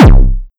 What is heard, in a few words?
Kick Dist Tech; Erratic